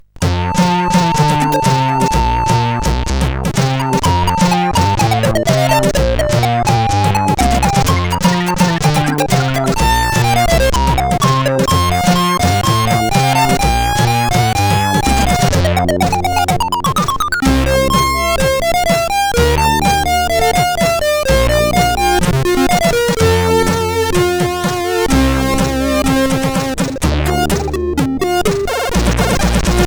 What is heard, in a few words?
Lings Tune, CSG8580; CSG8580R5; hvsc